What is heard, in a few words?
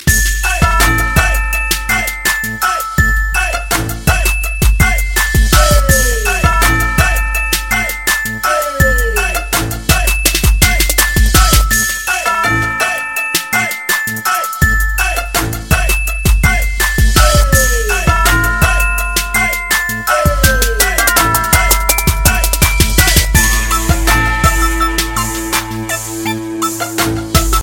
Hip-hop Nokia